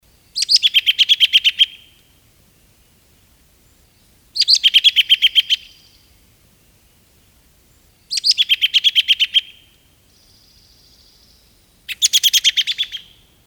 Robin Call danger; communication

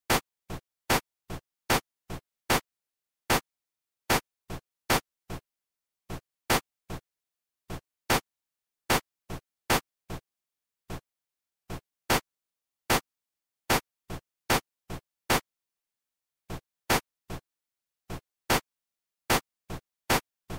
sync missing beat, rhythm; music

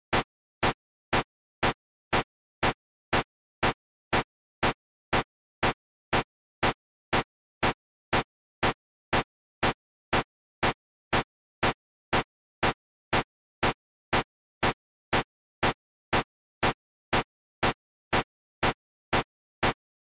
One beat, rhythm; music

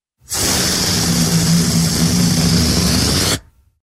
Balloon deflate

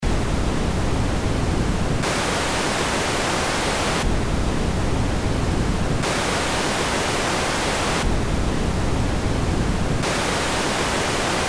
Pitched Noise Lo Hi; A Description of Sound and Its Qualities

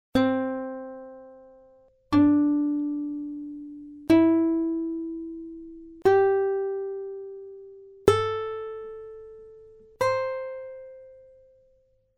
Plucked string pentatonic scale; music

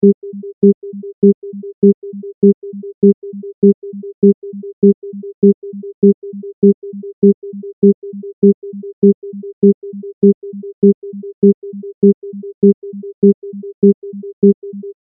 2 beat over 3 beat, rhythm; music

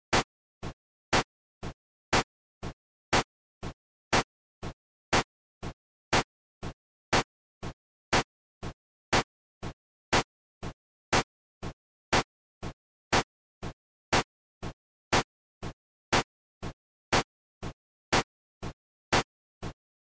Two-beat; music; rhythm

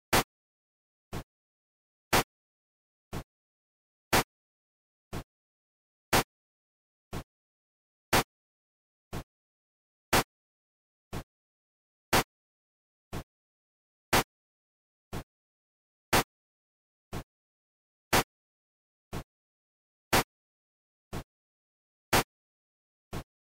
Two beat slow, rhythm; music

